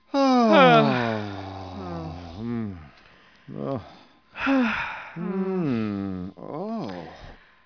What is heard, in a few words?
people